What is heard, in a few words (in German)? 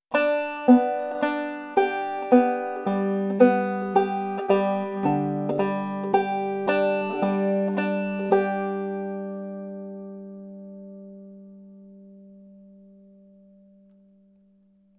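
drop thumbing saitenklettern, banjo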